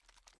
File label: inspect; gmod; viper; weapons